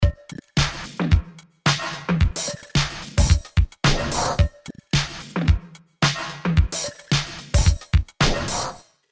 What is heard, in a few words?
Rhythmic Mangle; Arturia CMI V; Efx FRAGMENTS